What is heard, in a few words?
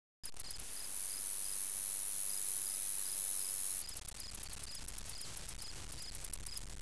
cicada, ambience